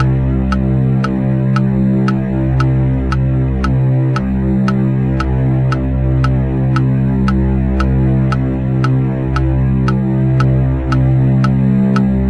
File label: clock; Rosegarden; TV3